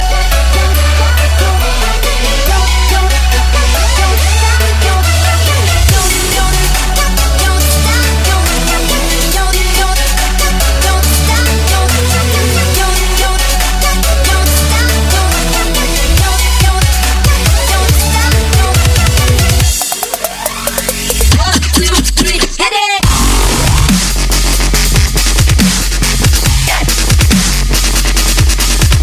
dirty disco

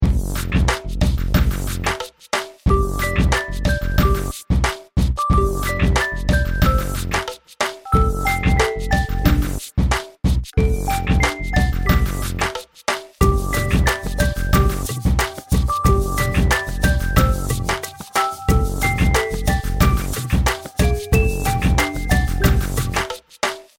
jingle bells remix

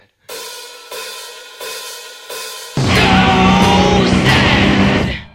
soo sad

